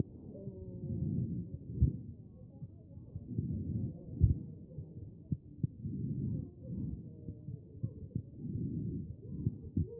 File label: chest; B MODE